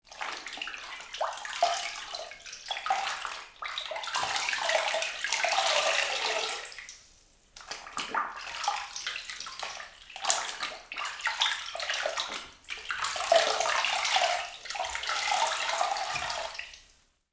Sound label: soak fabric; cleaning